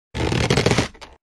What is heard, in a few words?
angry birds slingshot